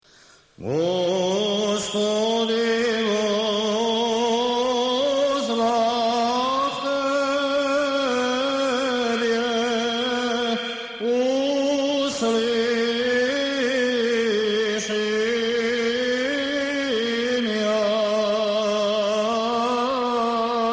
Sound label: osmoglasnik iv glas